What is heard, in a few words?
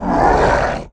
Death